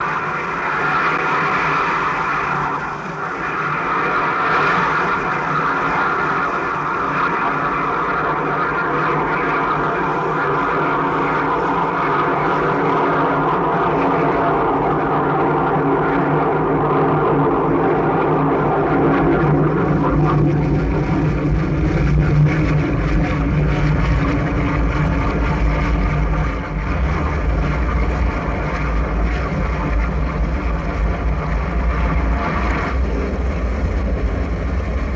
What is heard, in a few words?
Suction Wet Dry Drai